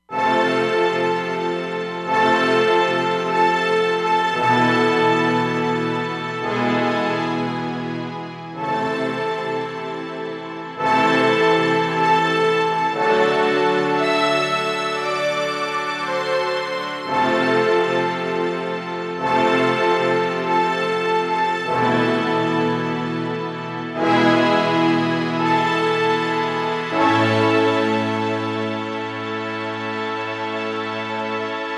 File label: Roland Fantom XR; Ending Scene